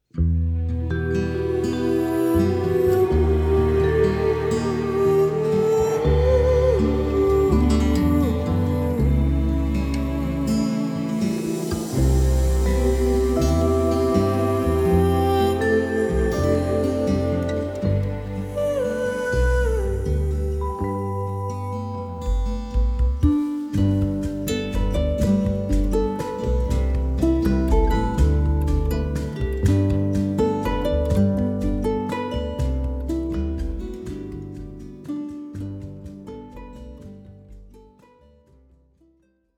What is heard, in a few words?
Inisheer Waltz